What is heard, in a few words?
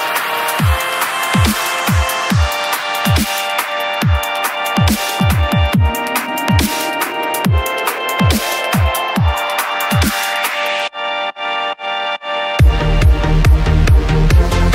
Vivo S1 Ad